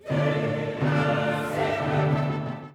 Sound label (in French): orchestre